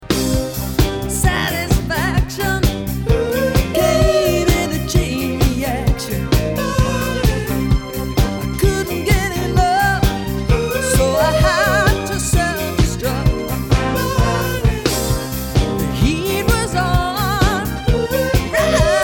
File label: disco